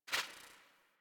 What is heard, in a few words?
UI Theme Dialog Close; Warframe; Theme Zephyr